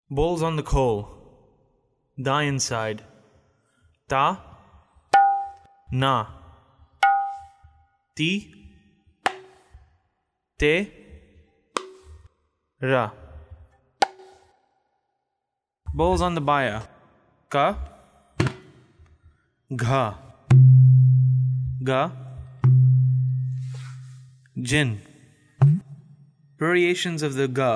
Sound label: khol bols